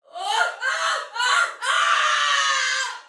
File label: Death Fire Soldier Female, Battle; Dialog; Sinecure